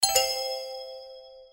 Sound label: info page close